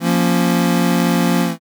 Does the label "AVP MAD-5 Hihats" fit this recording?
No